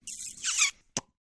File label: kiss